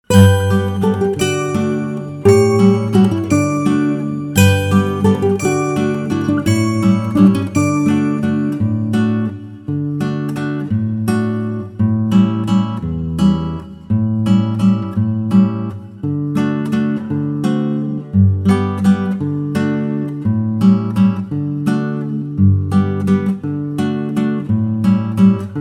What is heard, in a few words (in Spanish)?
Cielito de Navidad Pista instrumental sin melodia